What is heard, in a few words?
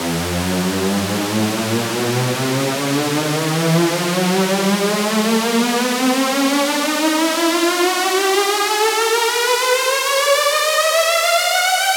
Saw E 8 Bars (Higher)